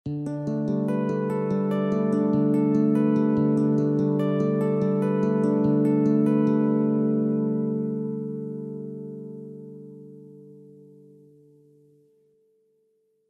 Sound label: Arpeggio Electro